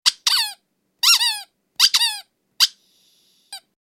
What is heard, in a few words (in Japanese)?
キュッキュー